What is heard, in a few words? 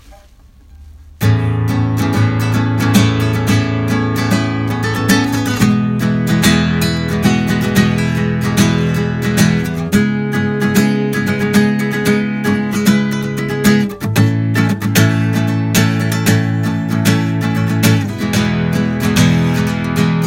Yamaha C70; Аудиозапись Yamaha C70-2